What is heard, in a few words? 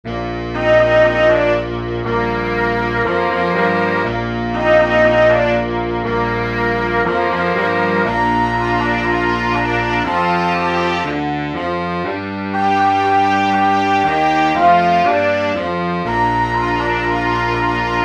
sans alto sax